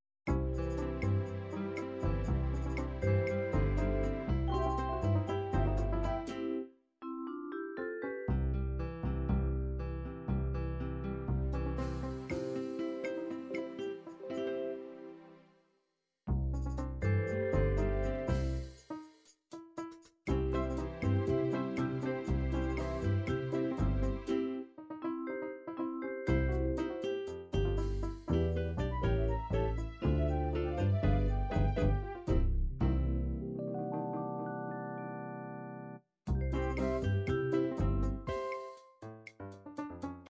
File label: latin bolero, midi